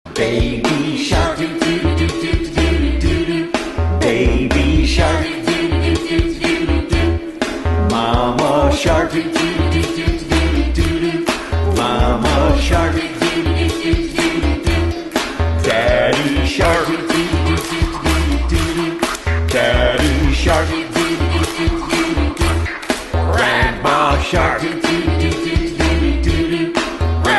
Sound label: song